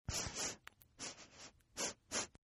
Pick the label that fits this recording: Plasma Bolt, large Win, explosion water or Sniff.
Sniff